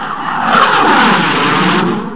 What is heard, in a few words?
JET FLY BY; TRANSPOR